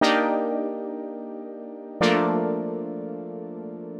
120 Pad Chords C, Keys, Nu Jazz House; PowerFX

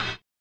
collision; Spectrasonics; Metamorphosis

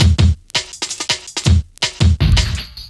Break, Loops

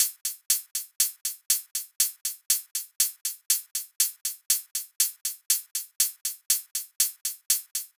Hihat, 120bpm; Zero-G; Nu Disco; Nicengroovey C

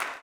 CLAP, Blues Soul; Spectrasonics Vocal Planet

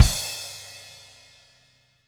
CymKick, Latin Percussion

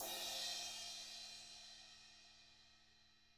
BRUSH CYM, Drums; ILIO; Double Platinum Drums 1

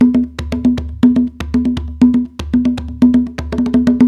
Udu Calypso

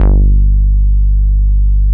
MOOG1 BASS G1

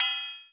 CYMB, Drum & Bass, 170 BPM; Ueberschall; Drum & Bass Resonance

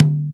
TABLA; AKAI S6000 CD-ROM - Volume 5

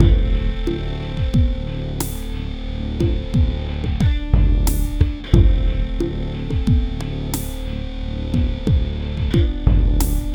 Natural, Hip-Hop; Sonic Foundry (Sony Creative Software); Hydroponic Hip-Hop; Combo

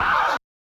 SCR, Yamaha DJX 2B

